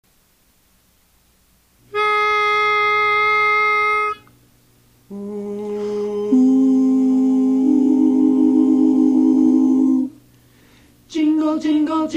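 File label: jingle bells baritone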